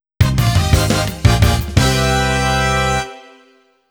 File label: Clear Jingle